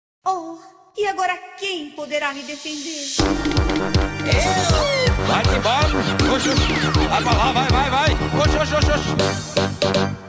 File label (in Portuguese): piseiro